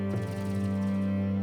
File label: Music Only; Electa Quinney